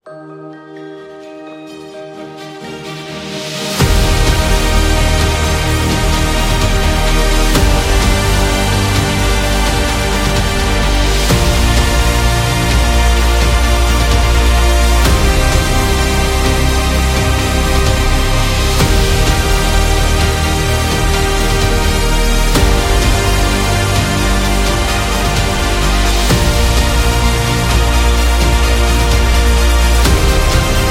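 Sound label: Triumphant, epic cinematic trailer music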